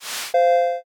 transition

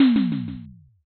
impact text

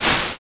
swoosh

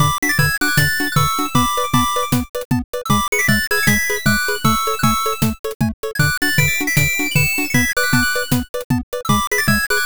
bgm bright

